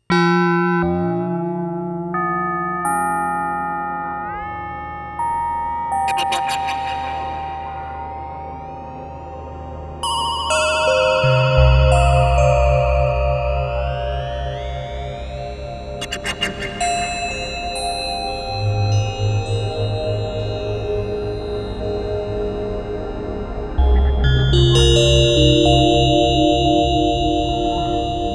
MODX FMAWM; Blade Runner 2018

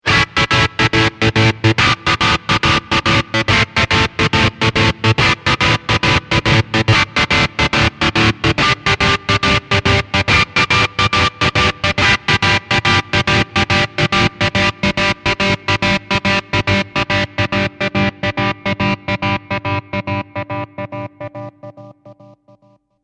tremolo

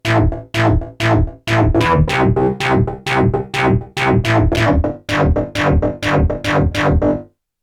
Modal Argon8

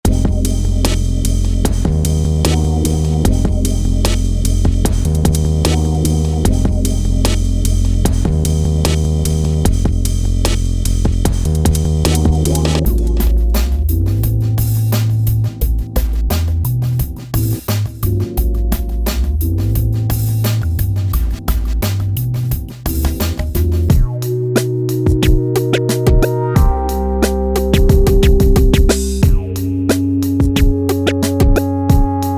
Korg Electribe EM 1 Trip Hop Patterns

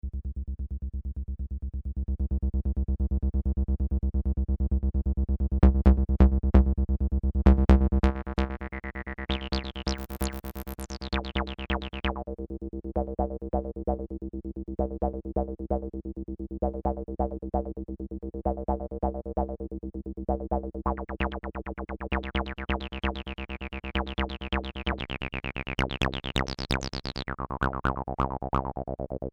Roland TB 303 BowBow Test

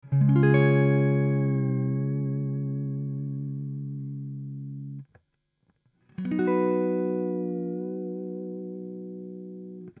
Guitar dry

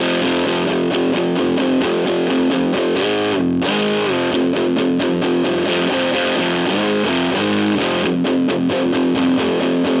DC Junior Fat Fuzz; Harley Benton

